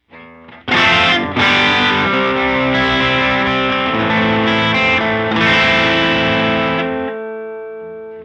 Phaser bypass; Instant

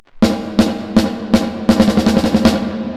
Crash

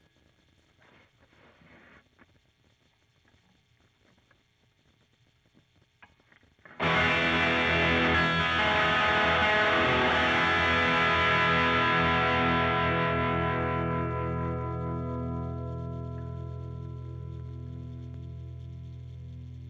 Guitar De-noise Pick strong

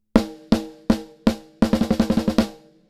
Valves snare bypass

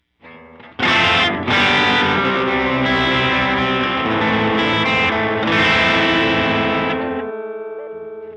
LFO sawtooth; Moogerfooger MF-104S

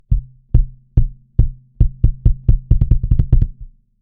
bassdrum

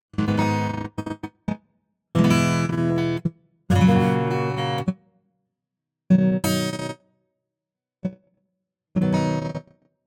Gate LF CUT 0.34 kHz; SSL X Gate

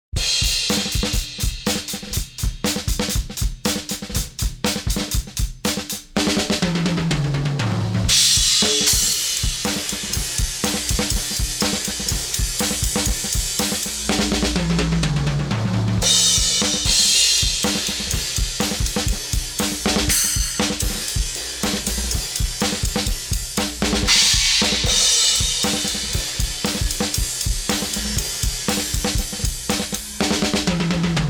bass cut

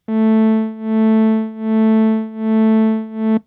Nord Lead 4 tremoro

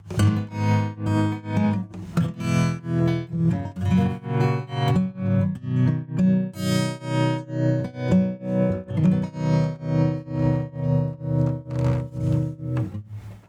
Speed 2.15Hz LFO Compressor; VolumeShaper 6; Ratio 100

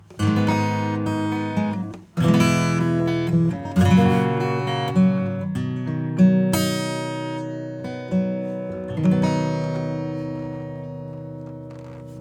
Acoustic; Gainstation

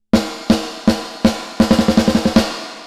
Plate Low Cut Gain 40.00dB Frequency 200Hz; UVI

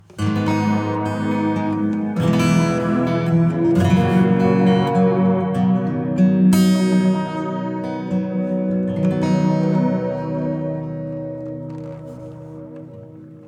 Delay acoustic; Cluster; preset MEMORY

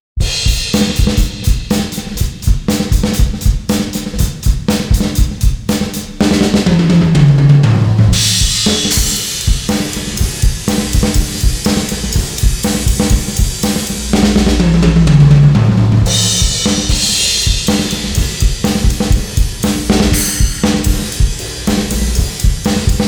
drums; smart reverb

